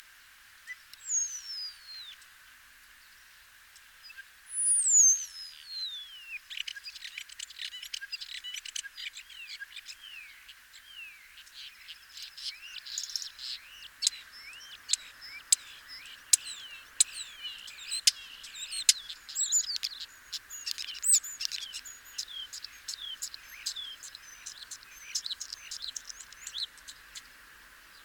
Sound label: Common Starling Sturnus vulgaris